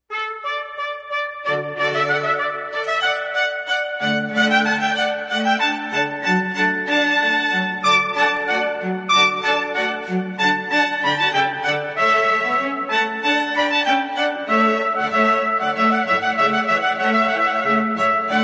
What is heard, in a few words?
watermusic, Southern Strings